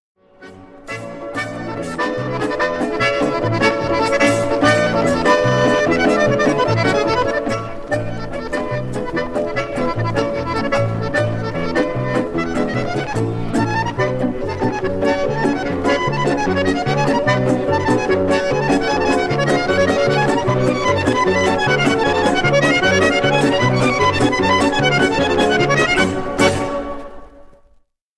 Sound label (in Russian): Весёлая мелодия